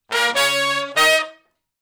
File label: Shuffle Blues Riff (Eb) low unison, Horncraft for R&B; Sections